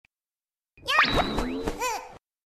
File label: Jump; Chibi Italia